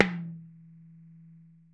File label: tom, Roland MC-909